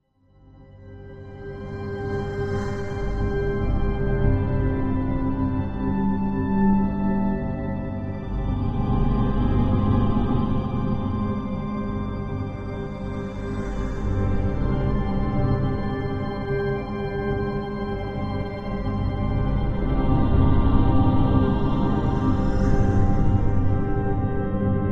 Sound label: Music Eerie Dreamy, orchestral